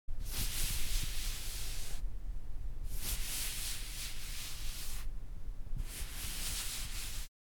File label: Fabric Petting Slow